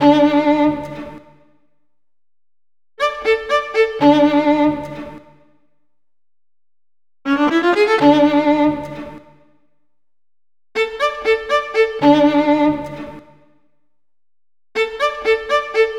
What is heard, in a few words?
Viola Loop; Sonic Foundry (Sony Creative Software); Club Joints and Street Anthems; Ruff Side